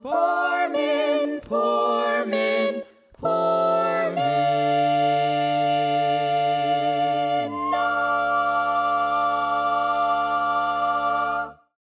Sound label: Tenor, barbershop tags